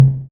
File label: Tr808 tom mid